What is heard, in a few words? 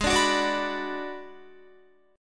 Ab Minor 7th Flat 5th Ab, Guitar; Abm7b5; strummed